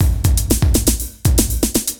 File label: CB LOOP; Zero-G; Chemical Beats